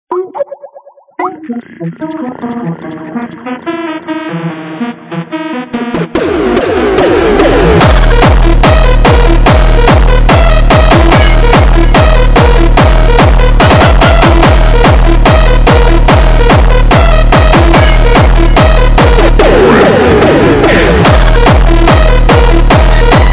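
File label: jumpstyle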